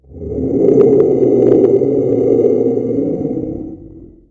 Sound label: cold breath